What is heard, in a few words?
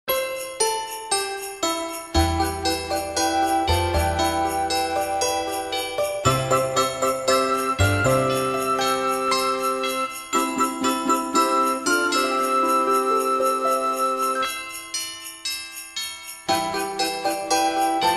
iphone christmas